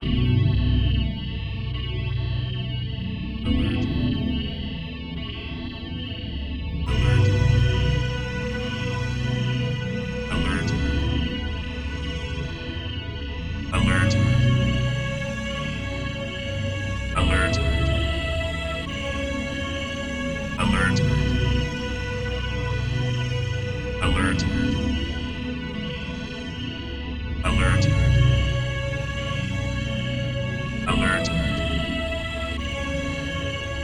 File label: Alert